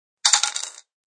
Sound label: Coint Toss, Coin toss into a bowl.; Clinks